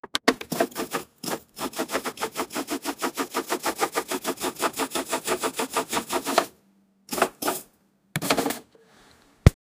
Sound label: crafting finished